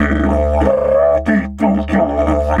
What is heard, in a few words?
Didge 93 BPM, Didgeridoo